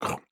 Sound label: Eat